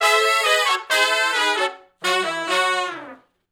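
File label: R&B Riff (F#) harmony, Horncraft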